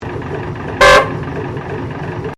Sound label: Train Diesel Shunter V8 Class 1955 Tasmanian Idling Whistle